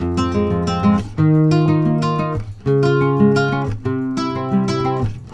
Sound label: guitar; maudio; Latin Element